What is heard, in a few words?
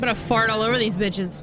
teen fart